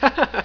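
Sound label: laugh; NOAHDEMO; JAPLAFF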